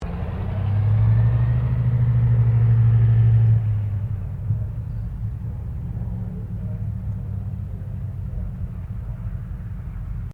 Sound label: AIRCRAFT DOUGLAS DC3 TAXIING; 1945 DC commercial aircraft; Airplanes; Vehicles; Aircraft Collection; Twistedtracks